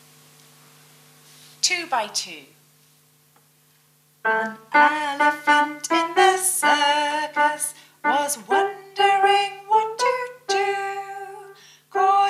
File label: Two by Two Vocal